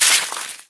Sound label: meth breaking